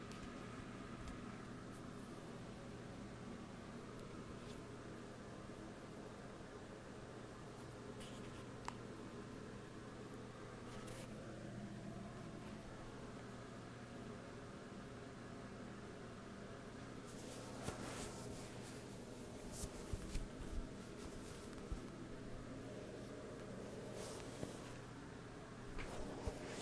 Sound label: sound of ginger sleeping